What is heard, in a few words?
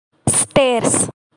STAIRS